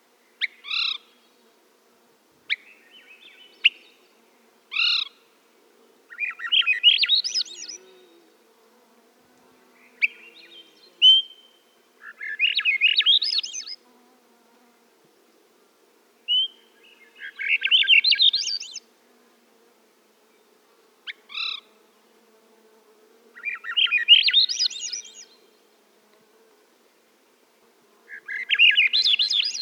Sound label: Swainson's Thrush